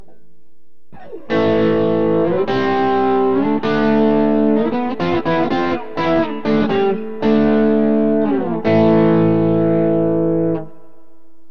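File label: marshall head bridge, guitar pickup; burny; fugly clips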